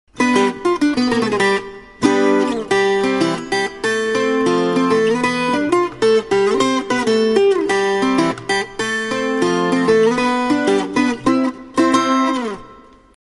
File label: tres